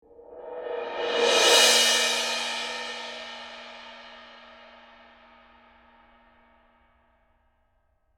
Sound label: Suspended cymbal crescendo